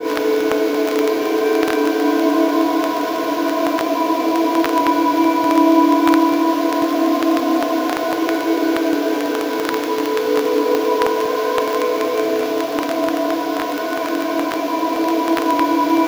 VINYLSNDSP

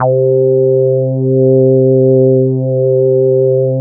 KT76 REZ, SYNBASS; Synthesizers; Soli-Music; World of Synthesizers VOL-1

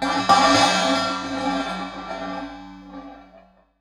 Raw HiHat, contact mic; musicradar; contact mic madness

